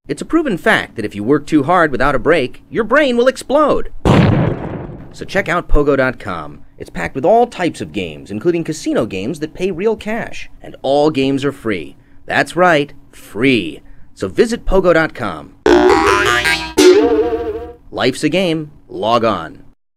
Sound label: brain explode